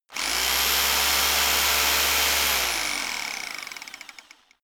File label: rock drill chisel burst long; schrauben drehen hydraulik